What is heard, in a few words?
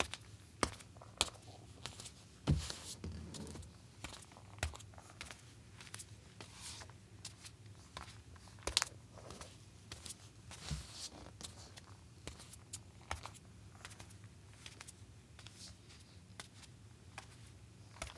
Footsteps Solid Wood Female Barefoot On Toes Slow Pace